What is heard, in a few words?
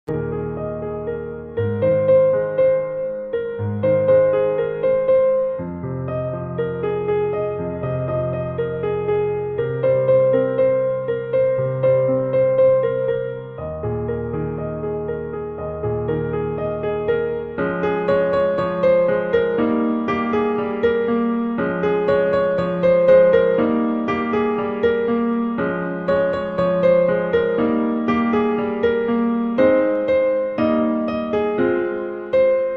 instrumental ringtone piano